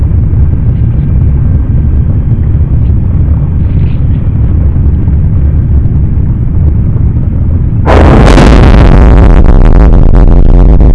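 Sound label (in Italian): sonificazioni eventi sismici; Sismografo Olmeto Grecia 13/10/2013; Linosa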